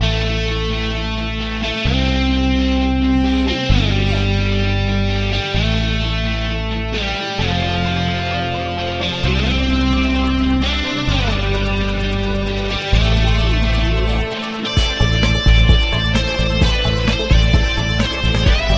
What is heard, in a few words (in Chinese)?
主界面背景音乐